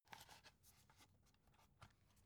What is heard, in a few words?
dvd case handle soft